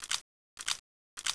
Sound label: generic shot reload, weapons